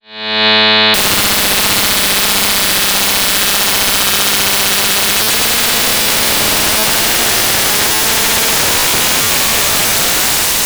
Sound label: E-RM Polygogo Doepfer A-110-4 Sine in Fold